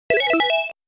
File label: Treasure Box Get; The Tower of Druaga